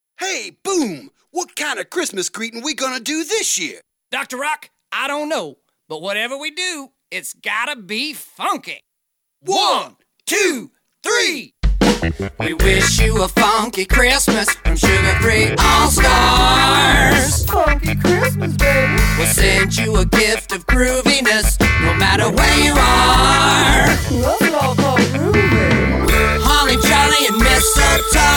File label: Funky Christmas